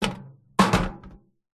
metal small container bin empty knock over or drop on concrete; zapsplat